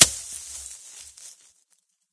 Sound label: hit leaves; weapons; source engine; dod